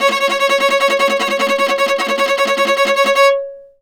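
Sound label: viola tremolo C#4; Orchestral; DirectWave; Varazdin Orchestral; Viola II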